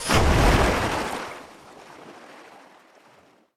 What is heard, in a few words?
shatter; World Of Goo